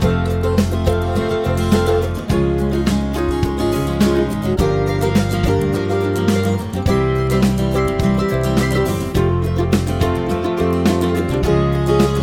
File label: chord prog with mixture